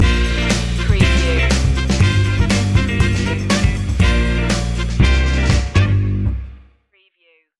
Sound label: funky baddy stinger